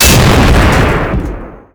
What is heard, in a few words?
ekgunnery heavyshell ap; Weapons; EK modern; Barotrauma